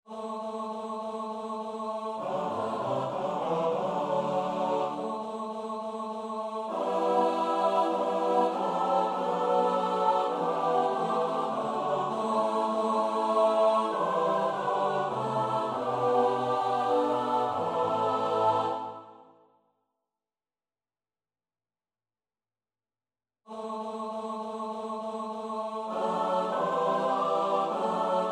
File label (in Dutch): Responses (S-A-T-B), Oefenbestanden; Preces en Responses